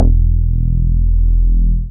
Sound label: BAS MINI, Mini-Moog